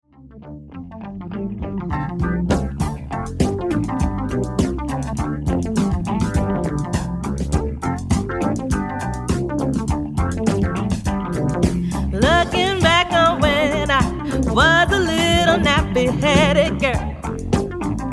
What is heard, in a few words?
Dance Band; 9-Piece; jazz-band